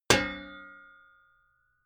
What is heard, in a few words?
sheet ring